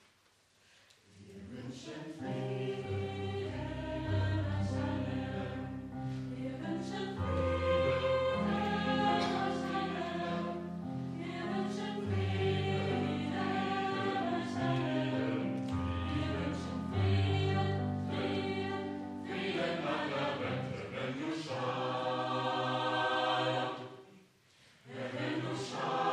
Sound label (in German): Jugendchor; Hevenu schalom